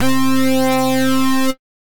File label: Fire Breath Bass Lead, Synth Lead